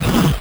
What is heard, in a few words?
ghost attack; Crypt of the NecroDancer